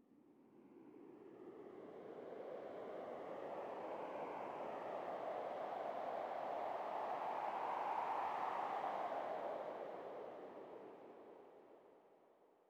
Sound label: sting wind gust; Rust